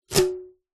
sport tennis racket strings strum fingers; zapsplat

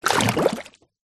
nature water bubble submerge; zapsplat

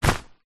foley bag nylon pe grab hand; zapsplat

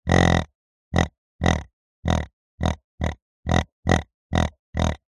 pig grunt designed; zapsplat

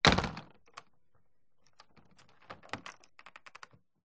household static caravan door close shut lock key

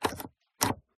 Button Press Hoover Big Plastic; PMSFX

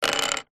office pencil small roll on wood surface

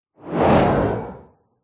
science fiction space vehicle whoosh past

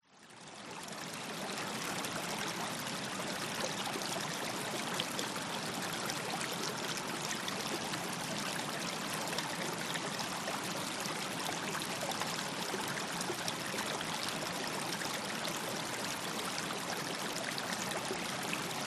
stream running gentle flow vietnam

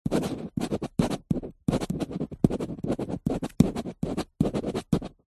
office pen biro writing on glossy paper

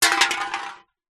soda can empty drop concrete ground

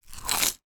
human person bites into toasted tea cake

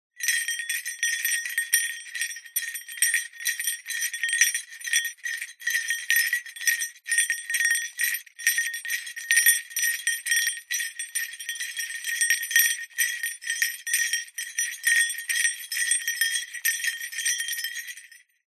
small bells costume jester long movements jingle

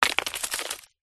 rock small roll down pile edge; foley

